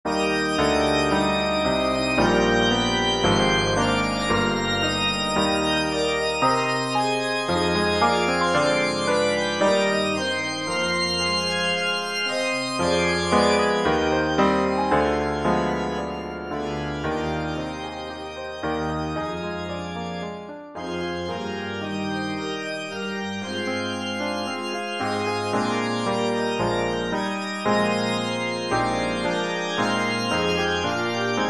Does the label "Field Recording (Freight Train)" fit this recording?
No